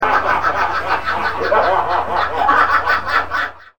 laugh

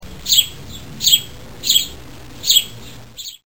House Sparrow

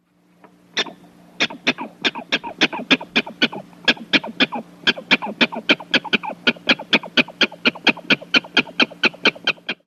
prairie

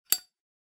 zippo open

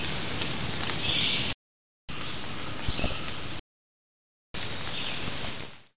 warbler

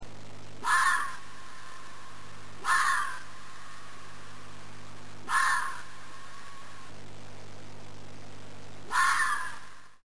fox bark long

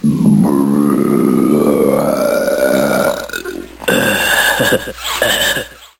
burp

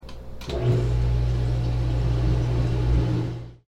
dishwasher bad

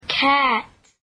cat

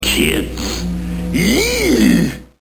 kids

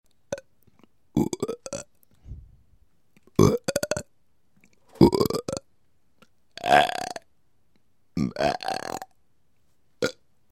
Belching and Burp Forced

